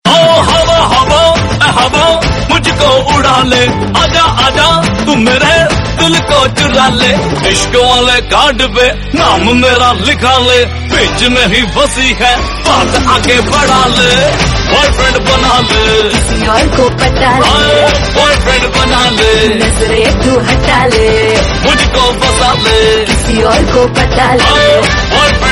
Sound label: new remix 2017 bollywood